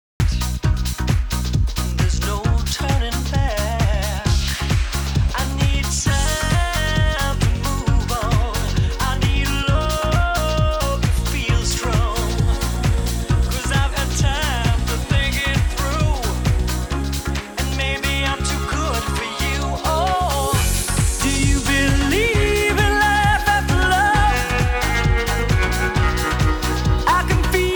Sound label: autotune